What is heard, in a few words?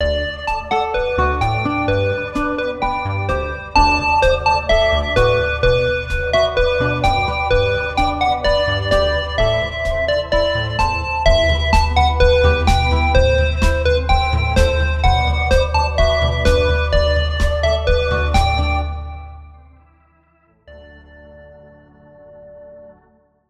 pixel love chant, streaming background music